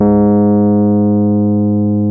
Rhodes g#2, piano; Giga Samples Collection; Rhodes Mey2000

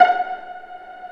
Vla Piz F#4; Digital Sound Factory Virtuoso

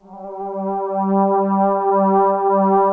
SYNTH PAD G3, SUPER JX; Northstar - New Gold VOL-1

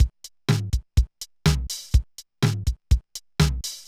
Loop, mxr; classic machines

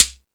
Timbale, E-MU Pro-Cussion; E-MU Pro-Cussion-1

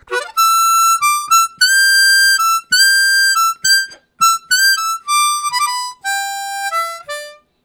Up High, Harmonica; Zero G

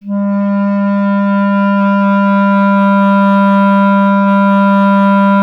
clarinet G; Miroslav Vitous; Solo Instruments VOL-1

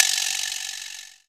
XR-10; Best Service Gigapack 2

